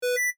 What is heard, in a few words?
sticky keys